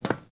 NPC Step Default, Footsteps

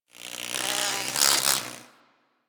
forest close insect buzz fly by; Ambience; Warframe; Grineer Forest

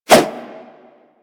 Nunchaku Whoosh; Warframe; Tenno

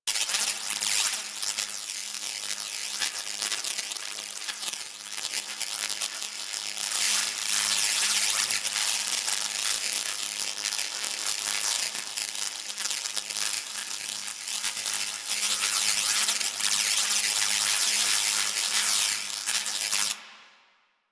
Ball Held Spark Loop; Warframe; Missions; Tenno Ball

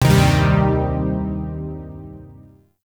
Shawzin Min Chord A; Chords; Warframe; Zariman

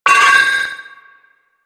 Trooper Vocal Pain; Warframe; DE; Sentients

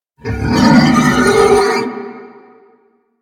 Horse Vocal Screech; Warframe; Vehicles; Duviri

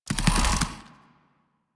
Infested Big Ashen Move Turn; Warframe

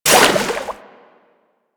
Aqueous Discs Hit; Warframe; Yareli

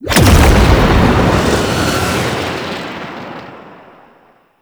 Void Fissure open; Warframe; Entrati; Conquest

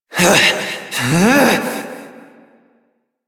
Frustration; Warframe; Entrati; Evil Twin

